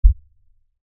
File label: LFE Hit, Lfe Hit Low Bass Impact; Hit Bass Flange Filter Production Element Imaging Element Accent Transition